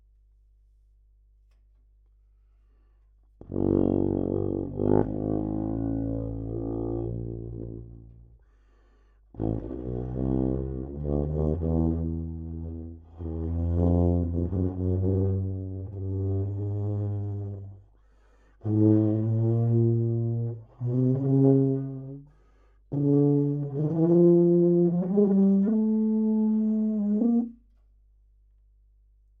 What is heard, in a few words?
Trombone sound; Jakob Munck